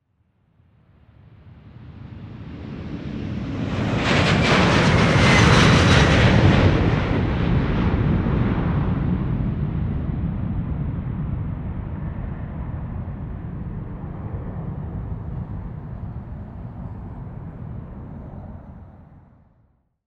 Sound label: 727 jet taking off